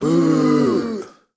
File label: Crowd Booing; Pitch Black Audio Pong